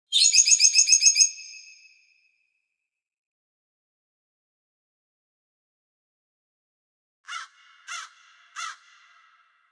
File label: Lark and crow, nature sounds